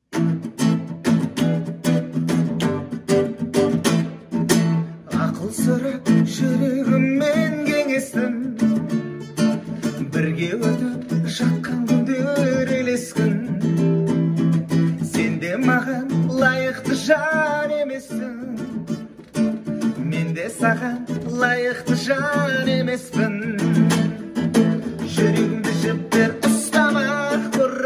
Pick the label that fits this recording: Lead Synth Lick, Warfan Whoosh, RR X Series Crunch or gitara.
gitara